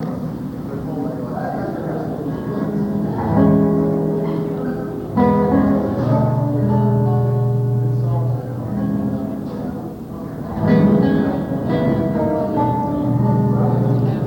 soundcheck

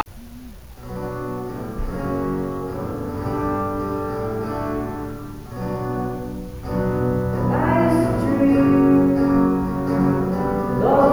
soundcheck

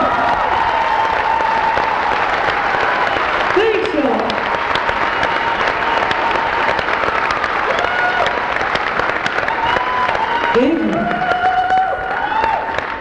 crowd

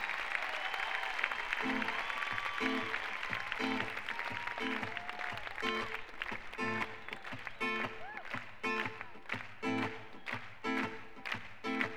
reggae jamming chat; the greek theatre; berkeley, california

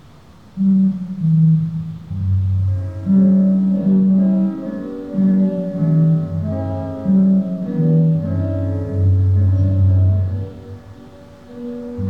soundcheck

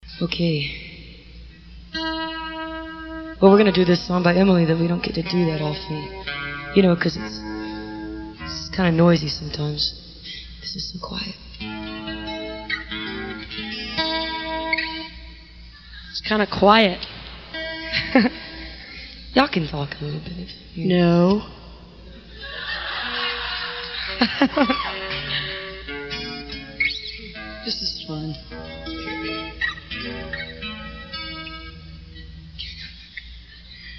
harmonica; harmonica threat; crowd talk

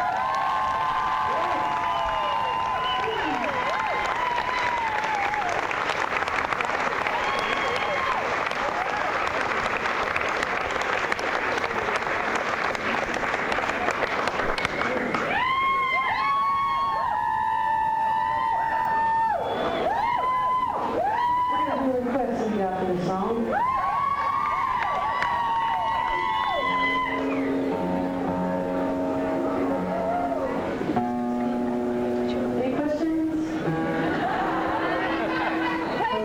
crowd

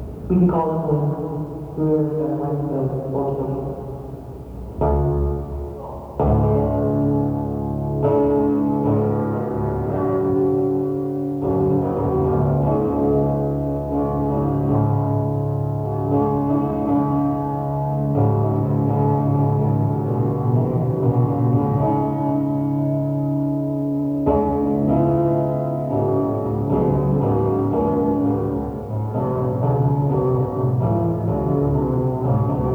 soundcheck

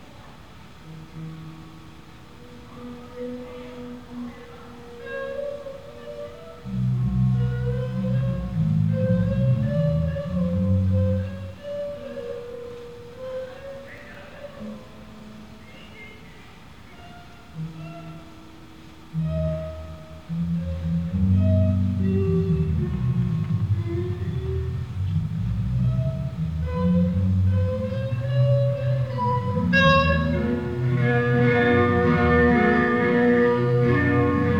soundcheck

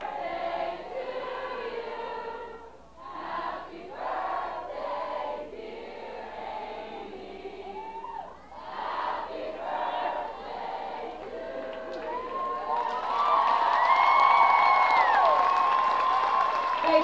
crowd sings happy birthday

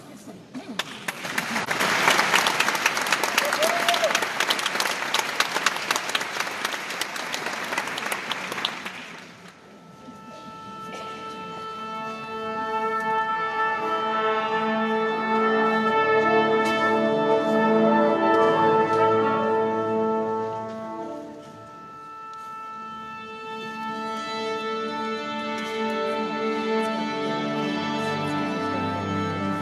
tuning; the meyerson symphony center - dallas, texas

